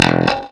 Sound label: e2 staccato, glowly bass